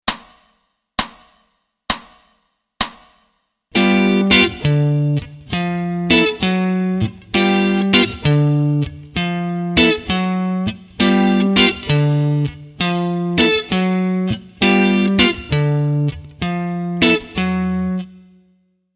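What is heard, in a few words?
Walk Bass Lines slow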